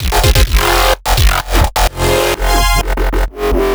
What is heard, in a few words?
128 F Bass Loop